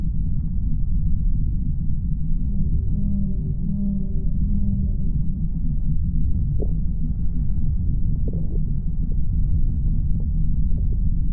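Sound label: voidspawn idle loop; Stellaris; reanimated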